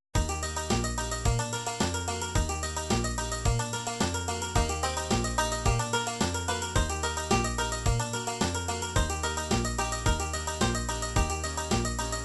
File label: karaoke, midi